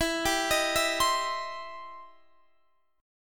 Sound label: E Minor Major 7th Sharp 5th E down; EmM7#5; arpeggiated